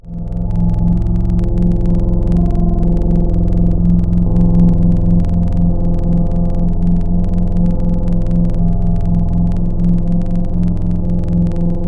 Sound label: octave, Serum Wavetables; Synthetic's Serum Collection; Surge Sounds - ASTRO - Serum Wavetables